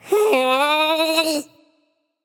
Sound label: sigh; smite; xingtian